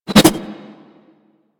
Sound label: Tonfa Parry Whoosh; Warframe; Tenno